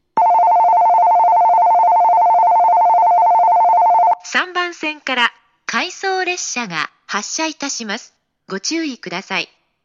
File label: Odawara Bell